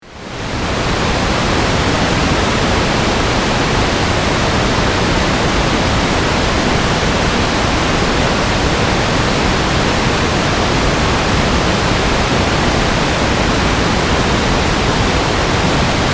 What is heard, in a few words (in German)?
ohrkan rauschen